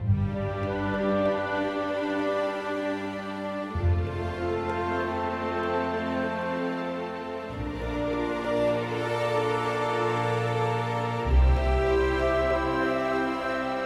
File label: Cinematic Music - Reassurance